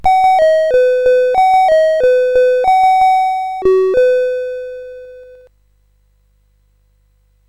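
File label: Mr Wiz, MOS 8580 SID chip music, games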